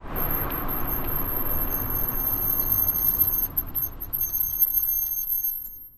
Car Break Squeaks St, brakes

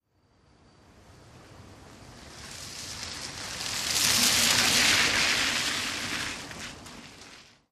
Car By Medium Speed

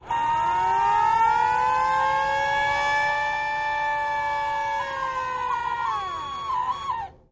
Siren Malfunctionin

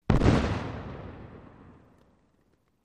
105mm Howitzer Explo

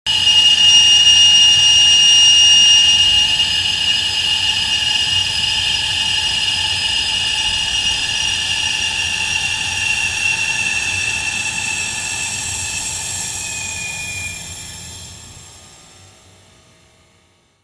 Air Blast Fast Heavy

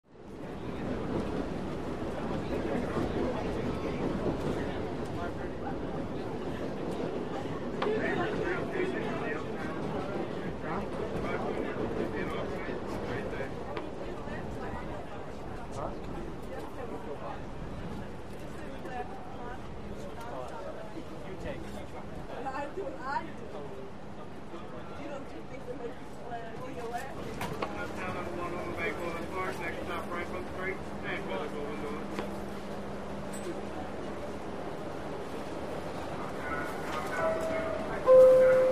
Interior Modern Subw, subway

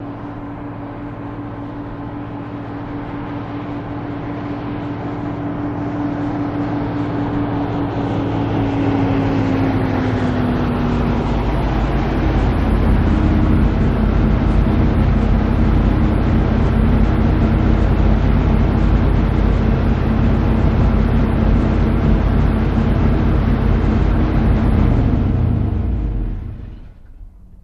Dodge School Bus In St

